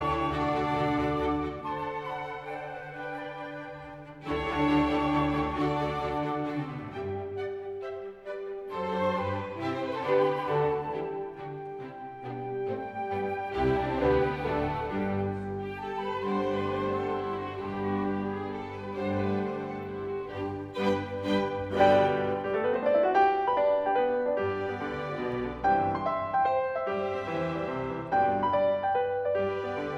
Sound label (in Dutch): Pianoconcert in G; Mozart; k41